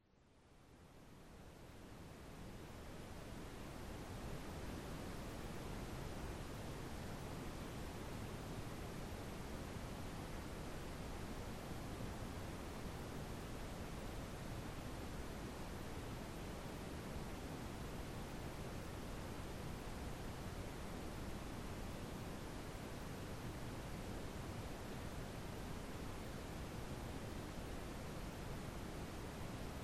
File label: Campfire Crackling; Calming nature recordings and ambient soundscapes